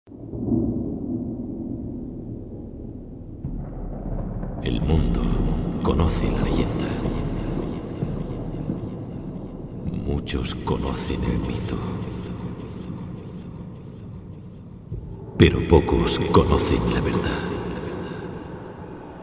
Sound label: intro eco